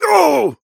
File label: pain death; Operative